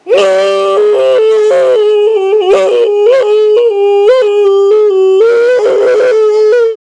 uuuuuuhhhhhhh

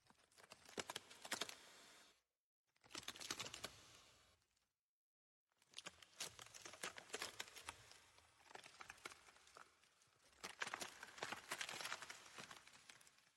branches breaking with echo

